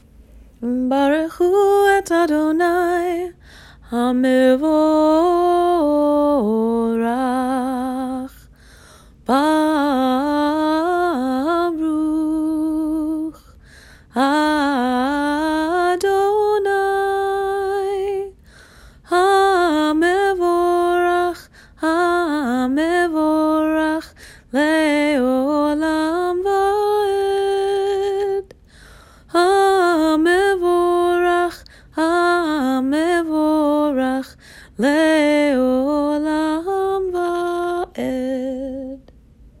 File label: Bar’chu Female Voice